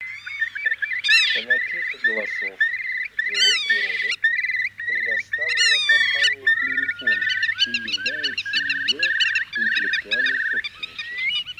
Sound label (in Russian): Кроншнеп Chiurlo Веретенник Pittima; plurifon